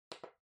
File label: Electric Fan Far Switch